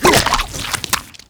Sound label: head pop